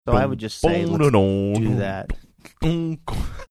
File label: singing bassline